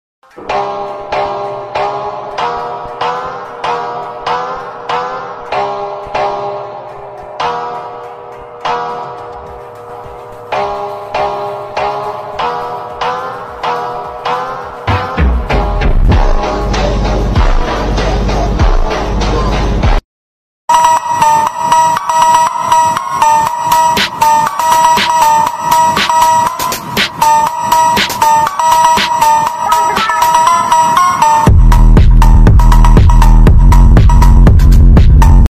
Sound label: attitude ringtone; sigma male ringtone